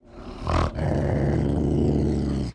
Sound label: Ocelot Growl Clenchd